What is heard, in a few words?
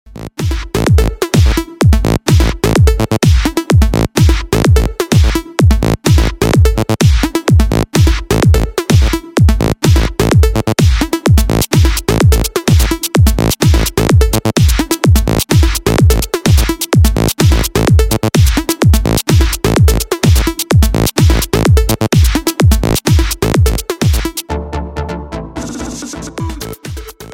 Electro House